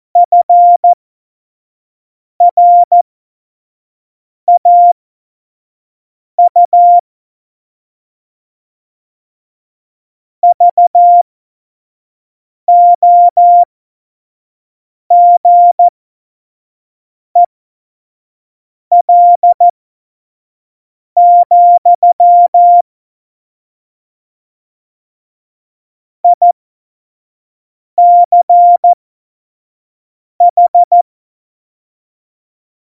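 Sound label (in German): Witz, Morsekurs, cw morsen; Witze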